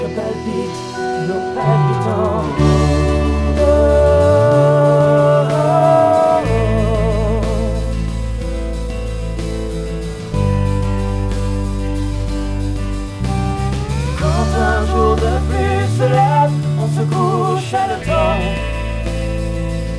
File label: harmonic